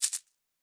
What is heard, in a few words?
Coins; Survival Game Sounds - Lite Edition; Objects and Items